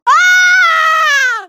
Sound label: k o scream; mk9; jade